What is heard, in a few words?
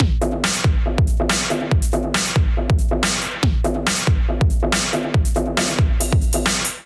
Kit Melodic 140 bpm, Drums; MIDI Clips; Matter Kit; Ableton; Factory Packs; Skitter and Step